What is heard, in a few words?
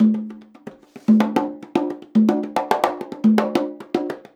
110 CONGA, Percussion Loops; USB Soundscan vol.36; AKAI